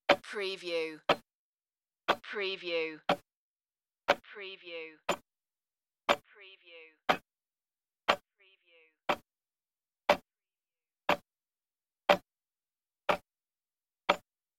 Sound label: Old Clock Ticking